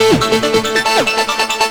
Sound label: NRG Arp, trance